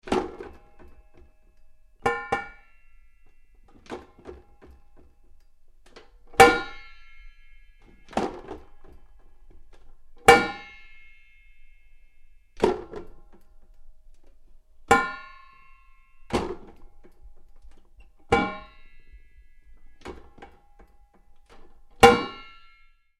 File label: Pedal Bin Metal Open and Close, Various lid open and close; Garbage; Tom Hutchings